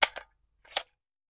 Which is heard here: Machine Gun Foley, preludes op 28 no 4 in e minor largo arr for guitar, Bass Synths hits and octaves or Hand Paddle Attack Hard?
Machine Gun Foley